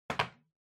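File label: TV Hardware remote control Put Wood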